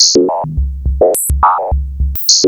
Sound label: SYSTEM 100; AMG - Vince Clarke Lucky Bastard